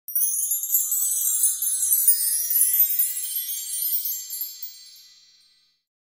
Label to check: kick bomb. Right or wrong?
Wrong